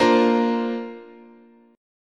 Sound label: Asharp Major Add 9th, chord, guitar